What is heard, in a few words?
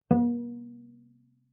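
Cello pizz fortissimo sul G Bb3; MIS Pitches - 2014; Strings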